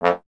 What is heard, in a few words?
Trombone F; Miroslav Vitous - Solo Instruments VOL-1